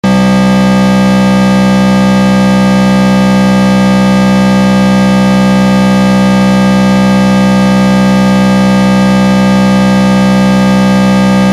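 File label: Siren Tone AIRHORN (RUMBLER)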